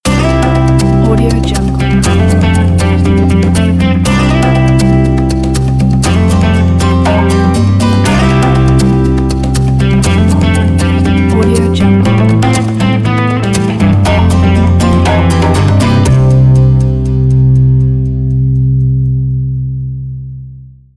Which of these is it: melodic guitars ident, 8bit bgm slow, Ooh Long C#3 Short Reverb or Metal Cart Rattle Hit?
melodic guitars ident